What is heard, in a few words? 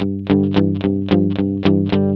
rock G 110, Vintage Blues Guitar; USB Soundscan vol.22; AKAI; ROCK2